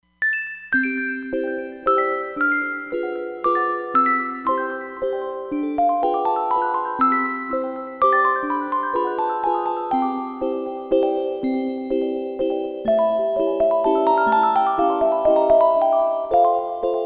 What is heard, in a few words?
Candy Bell, Roland Fantom XR